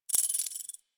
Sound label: Collar Jingle, Pets; Warframe; Zanuka; Zanuka Companion A